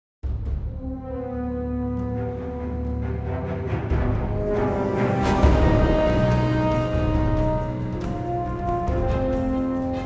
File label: Hatchery Music